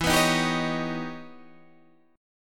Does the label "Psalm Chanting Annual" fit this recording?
No